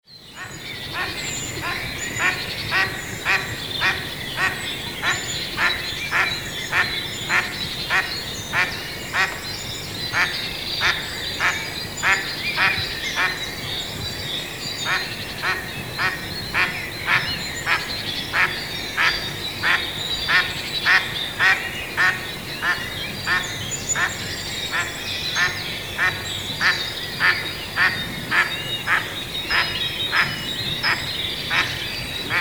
Gråsand; Mallard; Anas platyrhynchos; Niels Krabbe

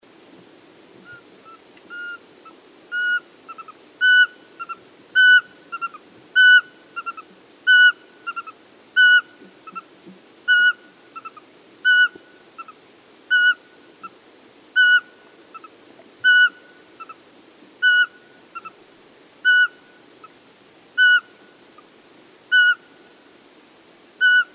Sparvuggla

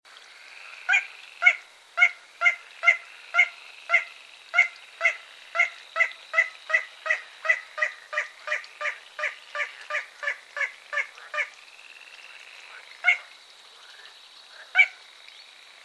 Mindre sumphöna

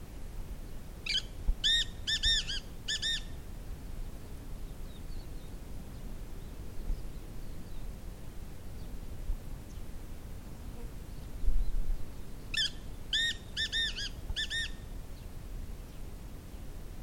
svart frankolin

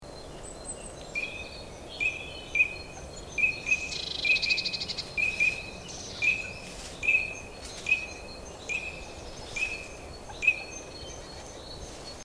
Kricka

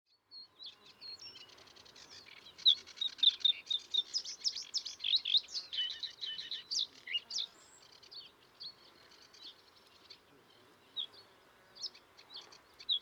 vitstjarnig blahake; PatrikAberg

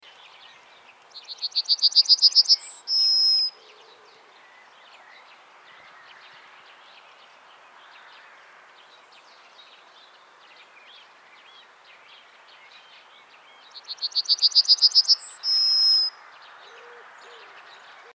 Gulsparv; Yellowhammer; Emberiza citrinella; Sander Bot

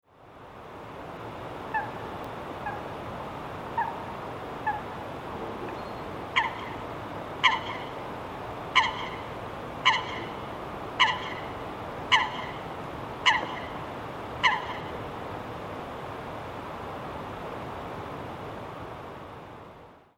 Sothona

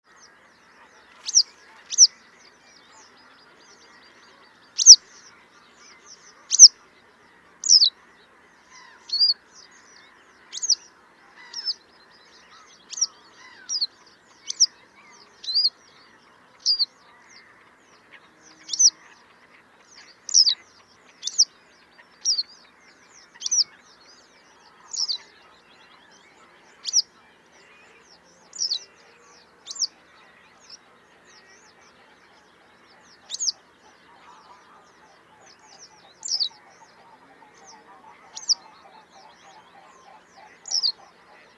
Gularla; Yellow Wagtail; Motacilla flava; Patrik Åberg

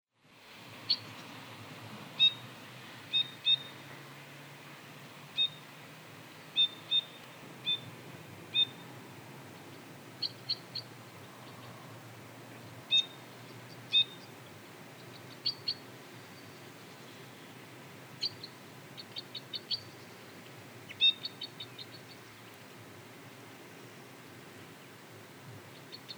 bandelkorsnabb; Loxia leucoptera; Two-barred Crossbill; Jelmer Poelstra

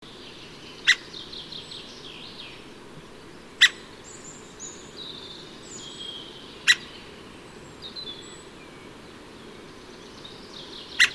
Tretaig hackspett

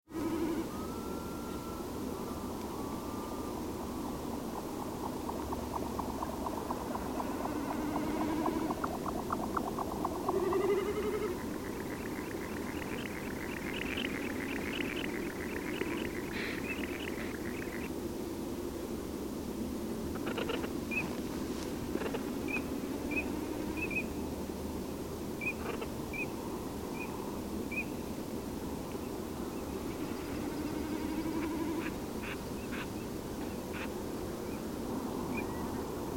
Dvargbeckasin; Jack Snipe; Lymnocryptes minimus; Patrik Åberg

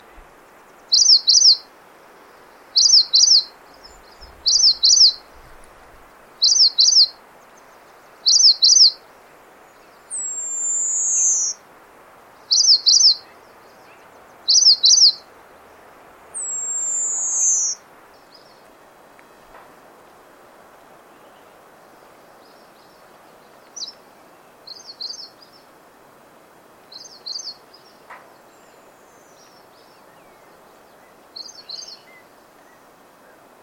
Bergstajagassngare; MNelson

